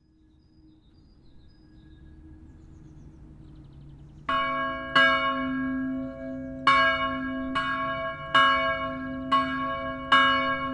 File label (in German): westglocke; friedhofsverwaltung